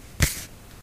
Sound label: card Shove